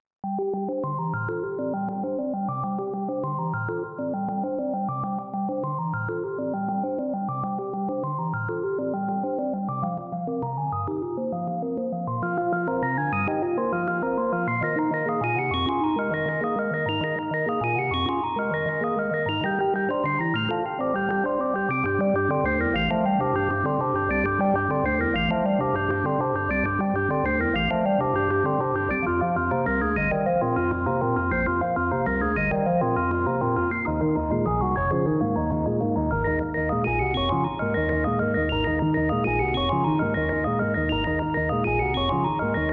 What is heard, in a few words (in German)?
Sequenzer Invertiert